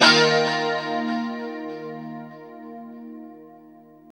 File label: 2CHD D#4, Guitars; Spectrasonics; Hans Zimmer Guitars VOL-1; JC-SHEEN